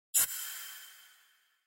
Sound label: UI comform sound